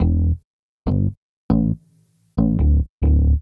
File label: Classic Bass, Drum N Bass